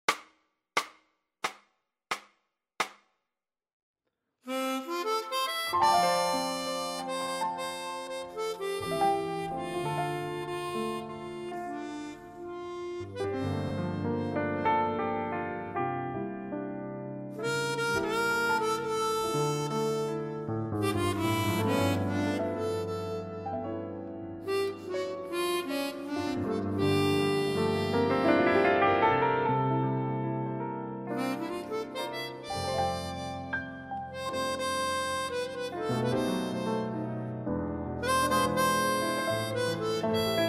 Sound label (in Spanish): piano y armonica, F